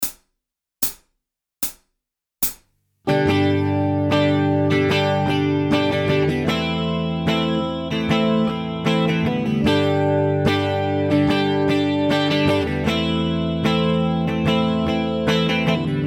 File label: backing